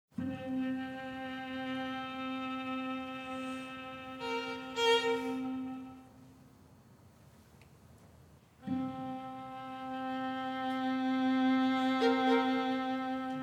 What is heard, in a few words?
String Trio No. 1; mvmt 1; Modern Classical